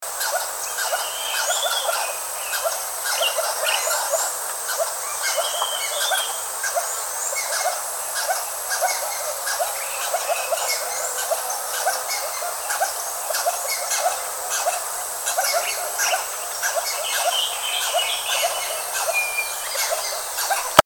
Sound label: Heliosciurus gambianus, attack dog; Congo Kinshasha; Mathias dHaen